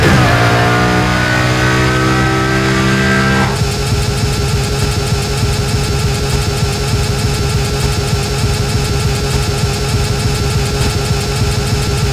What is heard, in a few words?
third, vehicles lambo